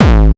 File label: kick, Heartbeats